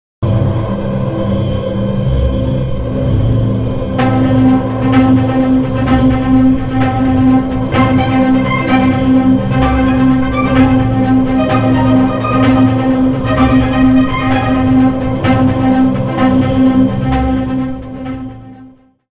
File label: Straight Performance Combo